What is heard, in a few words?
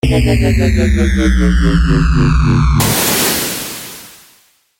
Funny fall